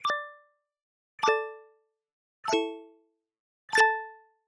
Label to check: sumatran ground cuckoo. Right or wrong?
Wrong